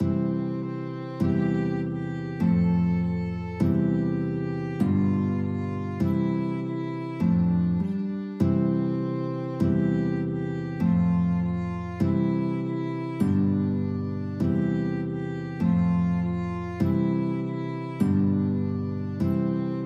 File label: violin guitar duet; twinkle twinkle